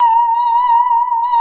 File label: saw danger, music, zombat